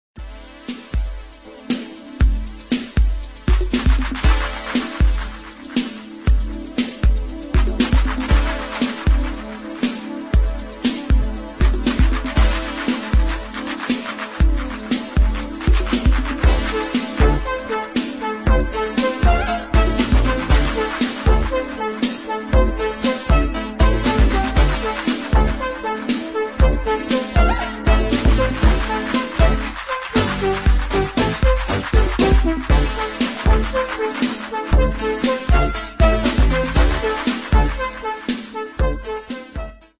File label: funky little beat